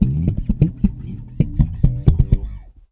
Bass FX E, Bass Guitar Licks FX

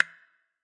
bigspace perc, Big Space Percussion; ETHNIC and WORLD PERCUSSION

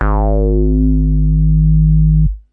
tight square G sharp 1, Tight Square Bass

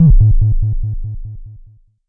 Jomox MBase, drum machine; Jomox MBase 01

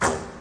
vintage cartoon bonk; Cartoon Sound Effects Kit

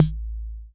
Jomox MBrane, drum machines; Jomox MBrane 11

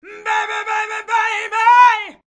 diva baby baby baybeh, Cheesy Divas

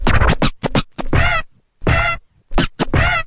turntable HIT, Scratching and Turntablism; DRUM LOOPS and BREAKS

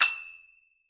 nipon cymbal, Japanese Drums; ETHNIC and WORLD PERCUSSION

